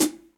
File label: Hihat Foot Classic Muffle; Drum Hits; Standard Hits; Ableton; Factory Packs; Session Drums Studio